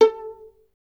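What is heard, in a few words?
STR violin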